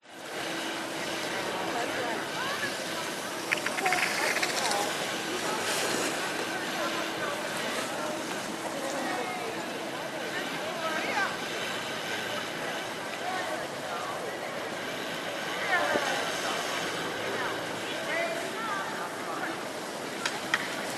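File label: Ski Slope Ambience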